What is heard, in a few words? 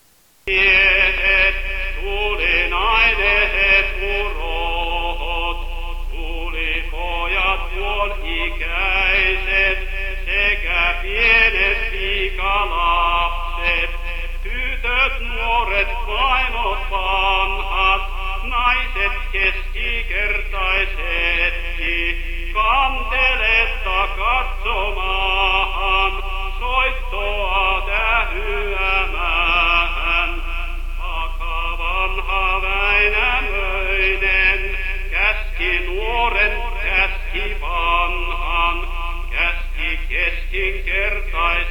kalevala song